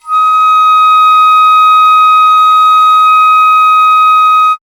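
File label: FLUTE PICCOLO D; Miroslav Vitous - Solo Instruments VOL-2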